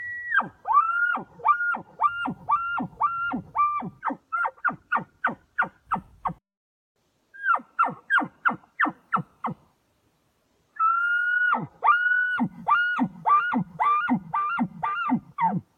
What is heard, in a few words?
Calls Chuckles